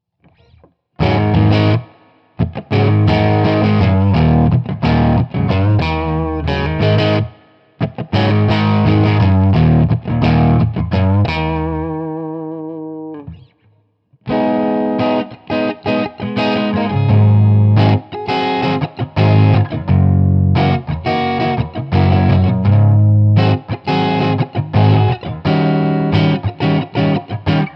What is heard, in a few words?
DSL PT Clean, Marshall; Marshall DSL Transformers